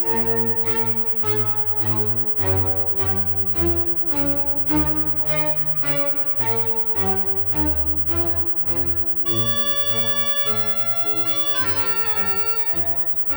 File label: sinus